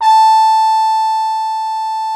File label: FR HORN A4, French horns; Greytsounds VOL-1